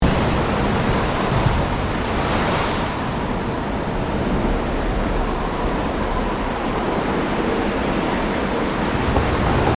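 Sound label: Ambience Desert Worm Hole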